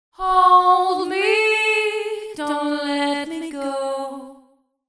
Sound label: slapback echo wet